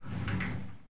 Shower Door; Lethal Company

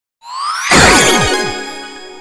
GOAL MISS

